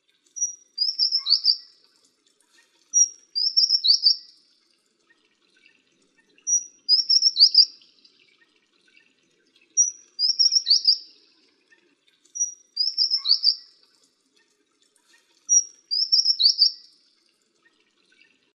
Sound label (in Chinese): 台灣鷦眉; 鱗胸鷦鷯、小鷦眉; 畫眉科/留鳥